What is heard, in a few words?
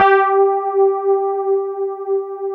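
Fender Rhodes G4; Keyboards Old School; USB Soundscan vol.09; AKAI; FEND.ROAD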